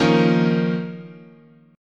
D sharp 7th Suspended 2nd, chord

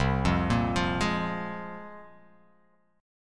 C 7th Suspended 4th Sharp 5th, C7sus4#5 chord; C7sus4#5 arpeggiated

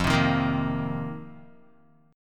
F 7th Suspended 4th F down Guitar

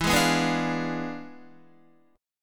E 7th Flat 9th, down, Guitar; E7b9; strummed; JGuitar

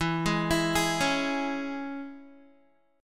E 6th, down, Guitar; arpeggiated

